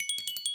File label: Coin Count Loop; netent